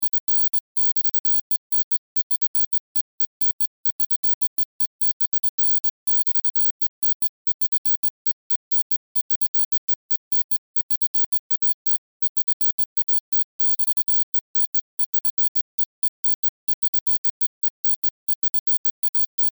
Science Fiction Teletype Readout Beeps; Communications

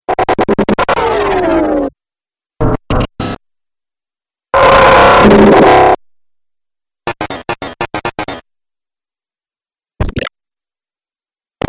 Dubstep Glitches

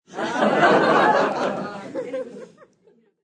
Crwd Lgh, A crowd laughs; Laughter

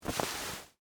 Wool Coat Wipe, Clothes, Hand, Wipe; Foley, Clothes, Wool, Coat; Dressing Sound Effects; Human; SoundEffects-BlastWave

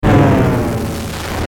Descend Static Fuzz Crackle; Static Sound Effects; Blastwave

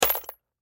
Bullet Shell; Bullet Shell 223 Drop Debris; Drops (Sound Effects:Objects); Blastwave; Warfare

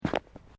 Footsteps Boots Road Step

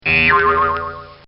Spring Bong; Funny Musical (Sound Effects:Musical); This sound effect was recorded in a custom built sound booth

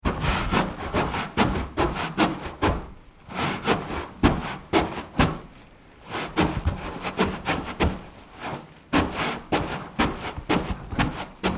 Wiping Shoes on Door Matt; Footsteps; Human; Tom Hutchings

